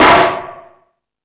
Hip Hop, Drum Machines

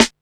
snare, techno 138 BPM

